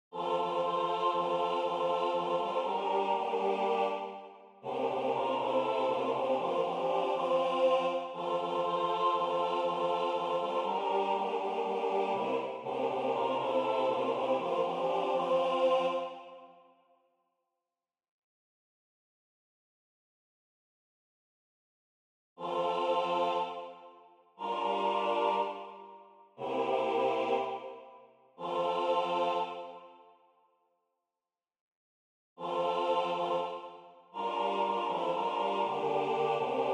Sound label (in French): tutti; ounenaya